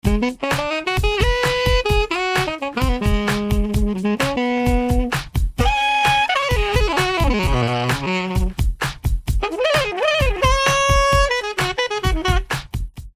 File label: Sax